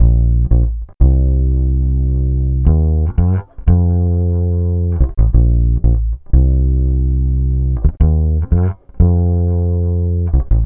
Vintage Fretless, Electric Bass

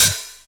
Open HH, Cymbals; Vengeance; Essential Clubsounds Vol.4; Open Hihats

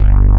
SAW BS, JV 1080; Pro-Rec - Synthsonic

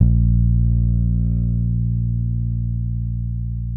FRET FNG A#1, PEDULLA BASS; Masterbits CLIMAX 7 - Bass

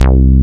JNO SPIK C3, SPIKE BS

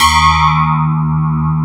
sparkles

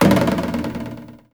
A#3 WARBL, JAPANI PERC; Northstar; The Wizard VOL-1

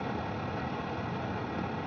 Ambience Loop, outdoor

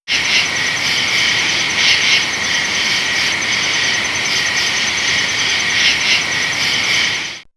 Nature, Nighttime; X-Static Goldmine

